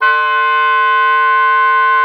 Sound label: OBOE3; Xsample VOL-6